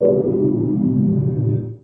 house ambience, pads

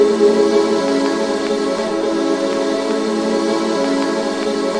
thick scratchy pad; Pads

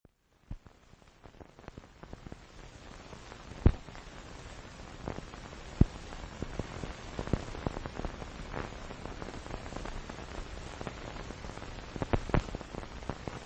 test noise; VOCALS and SPOKEN WORD; Quirky Female Typing Instructor

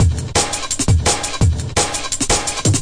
compressed conga break, 170 bpm; 161 to 180 bpm

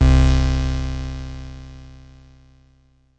real thin, synth

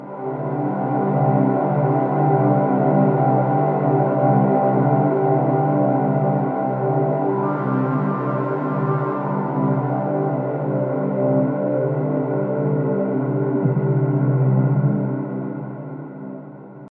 harmonic desert pad; Pads

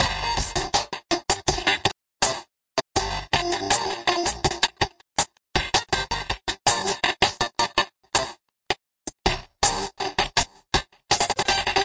081 deeply crunchy guitar stutter funk; GUITAR LOOPS

